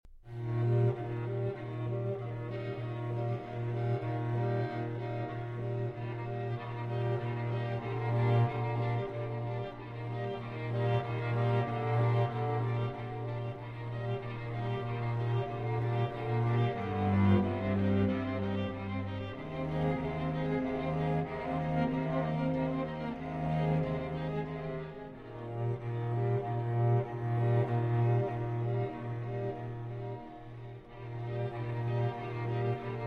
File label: String Quartet